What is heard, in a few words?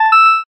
alert ping